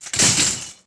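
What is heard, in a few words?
Lamp Collapse; objects; Star Wars Obi-Wan; XBOX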